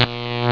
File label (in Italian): scossa